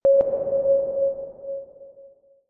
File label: sonar, magic